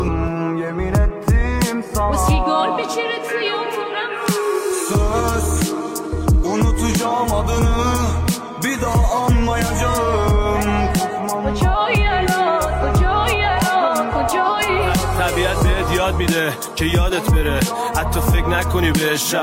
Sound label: remix rap ghamgin